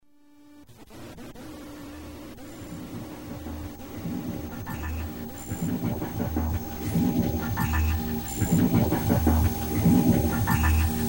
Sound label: boom crash crash toothpick